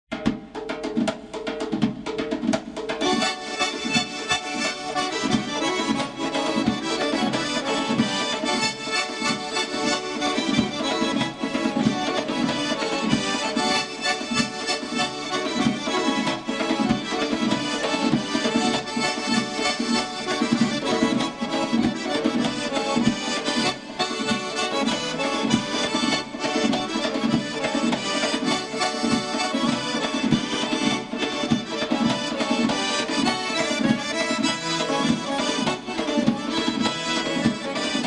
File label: georgian folk dances; svanuri